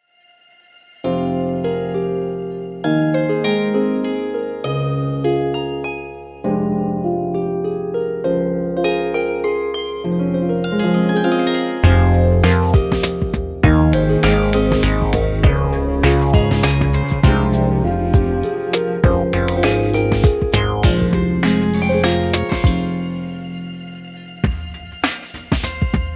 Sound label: Harp